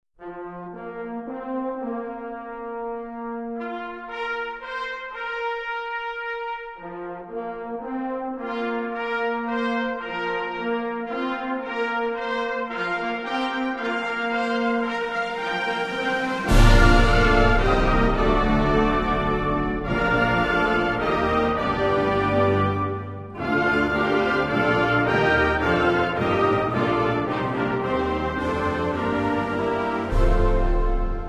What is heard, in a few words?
band flourish